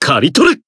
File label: attack, jp